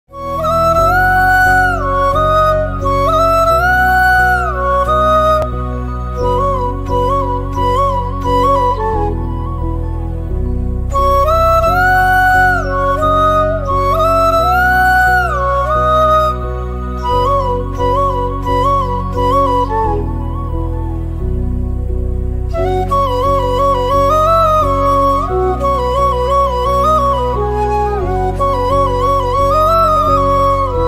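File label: flute background music flute cover background music ringtone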